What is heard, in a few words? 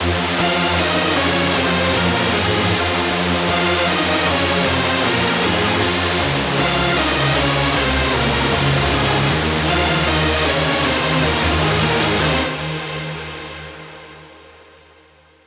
lead loop Fmin 155; Music Loops; GhostHack